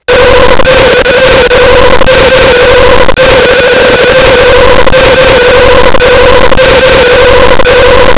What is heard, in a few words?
chimes